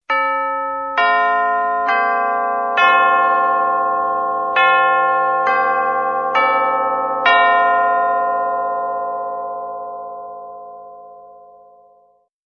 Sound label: Westminster chimes